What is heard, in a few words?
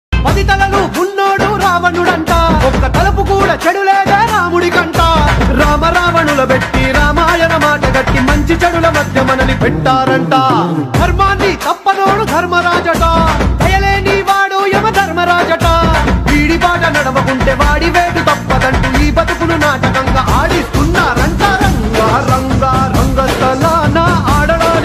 Telugu ringtone